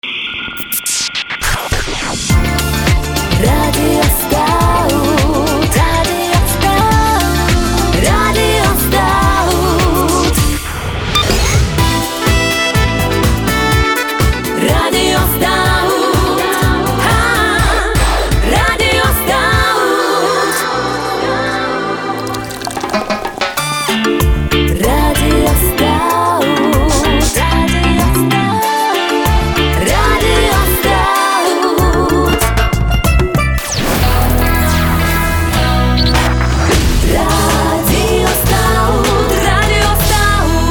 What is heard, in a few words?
Jingles Radio; Radio Staut; efir